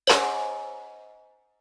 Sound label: metal twang